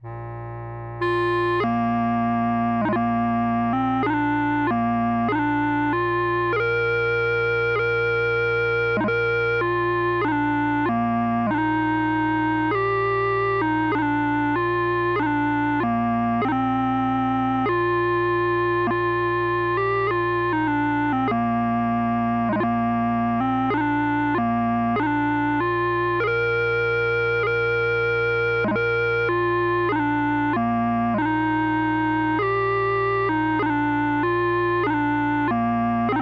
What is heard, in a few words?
Scotland, Wings, Scotland, pipes, 50 bpm; 4/4; Set 3: Scotland the Brave/Wings/Scotland